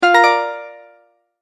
multimedia tone start

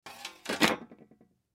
Saw Set Down Wood Workbench; Saw Set Down Wobble Wood Workbench; Blastwave

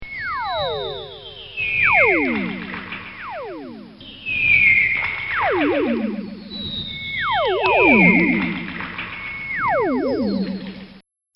Cartoon Sound Effects Whirls; Cartoon Sound Design

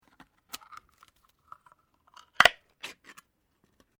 Can Metal Ringpull Open; various metal cans; Materials

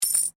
Coin Penny Multiple Drop Stone

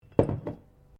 Bottle Glass Place Bench

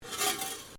METAL PIPE SCRAPE; Metal Sound Effects; Metal (Sound Effects:Materials)

